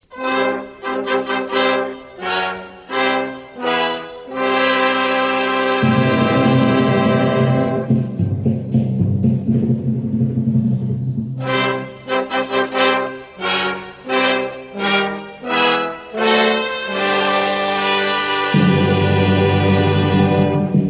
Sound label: Musica, spanish band music